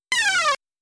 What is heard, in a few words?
Big Balloon Get; Big Balloon -Get(Bonus Round Clear); Mappy Game Sound Effect Original Soundtrack